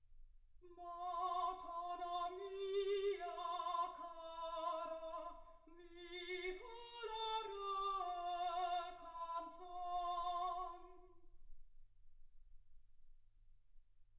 soprano

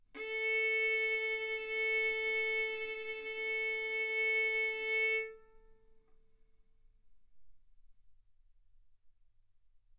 Violin arco A4 B4; mf; sul A; stereo

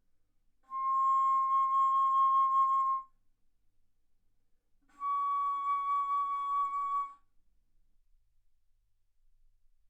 Bass Flute C6 Db6; ff

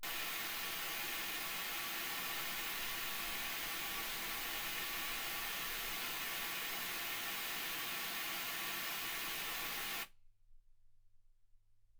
wgn

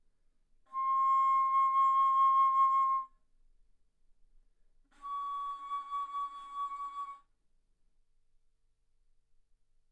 Bass Flute ff C6 Db6

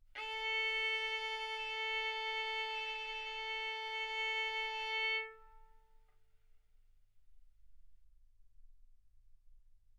Violin arco A4 B4; mf; sul A; stereo

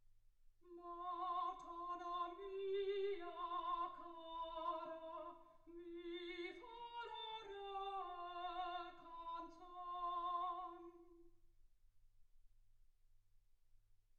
soprano

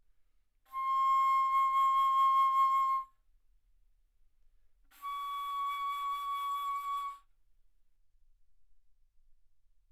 Bass Flute ff C6 Db6

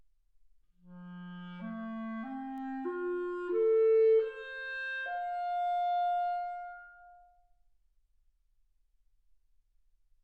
clarinet

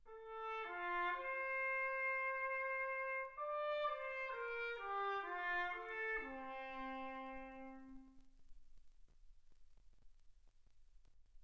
trumpet

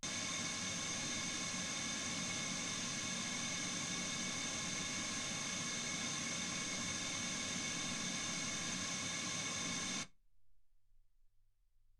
wgn 48kHz

